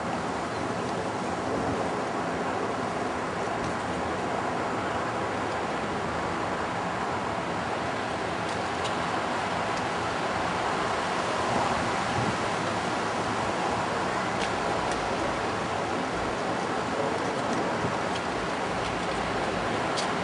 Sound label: speckled unknown, birds